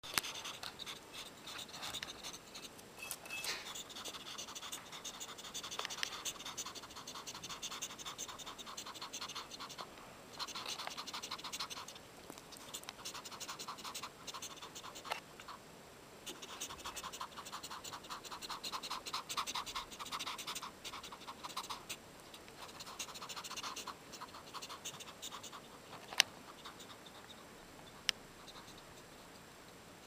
keisti garsai garsas, ferret

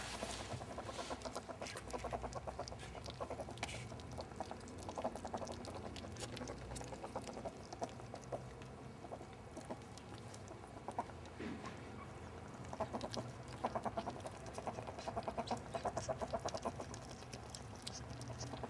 gugavimo pavyzdziai guguoja

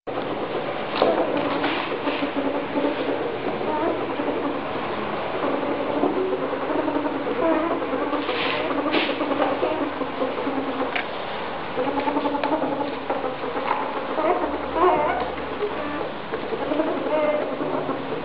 gugavimo pavyzdziai zaidzia su mama, ferret

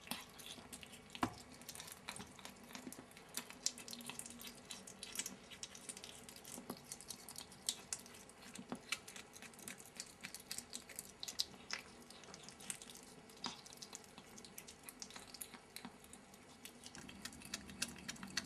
nesusije su balsu valgo grobi, ferret

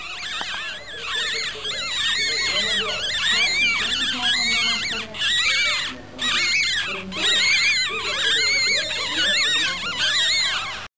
verkimas demesi, ferret

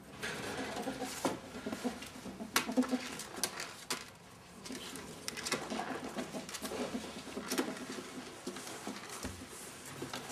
bendravimas tik cypauti, ferret

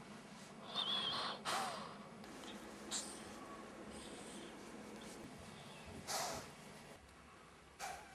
atodusis ziovulys dusauja

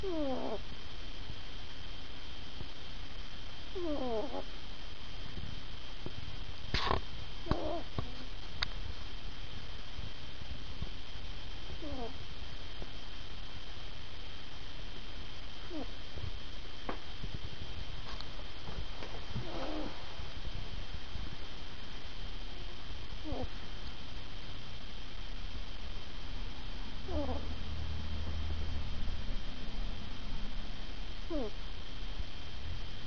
knarkimas knarkia, ferret

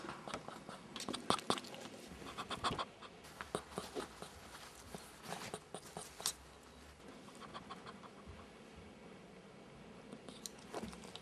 garsai zaidziant puskavimu

